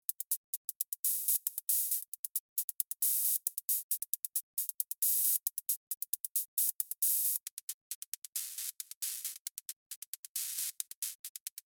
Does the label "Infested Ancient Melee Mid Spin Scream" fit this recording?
No